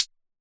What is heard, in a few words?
HH, Closed Hats